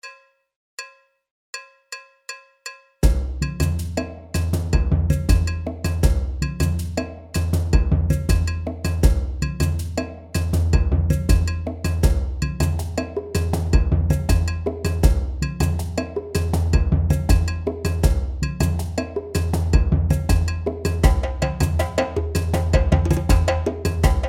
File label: Ambience